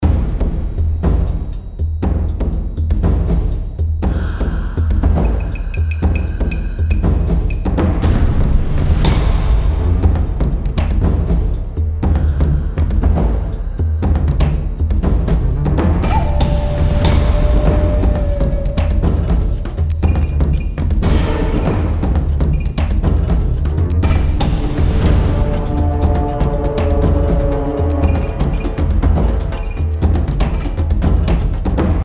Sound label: savage music jungle battle